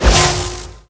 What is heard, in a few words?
crystal ray